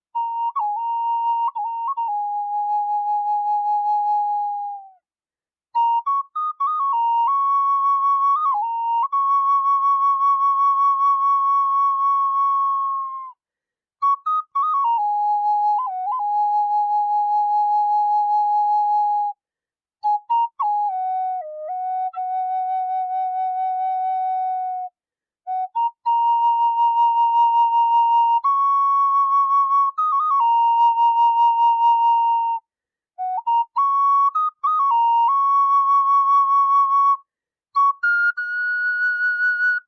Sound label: Native American flute key high Eb